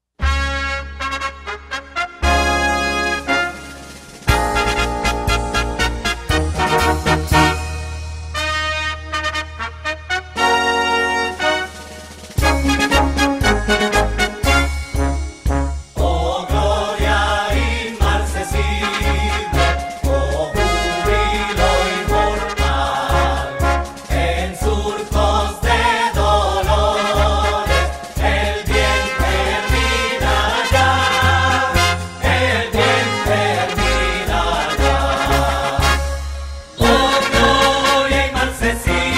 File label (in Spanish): Himno De Colombia